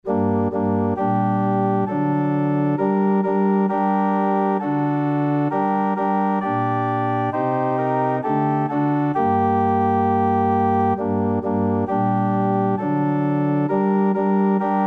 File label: bryn calfaria, organ